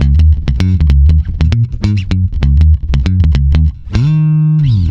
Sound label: D#, swing mute, bass; Spectrasonics; Bass Legends